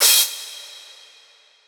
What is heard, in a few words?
normal hit clap; osu